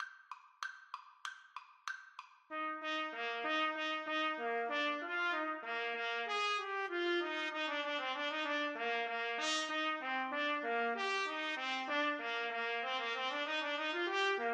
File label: trumpet duet